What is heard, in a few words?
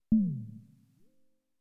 Fail sound; fmod-moloch; Respawn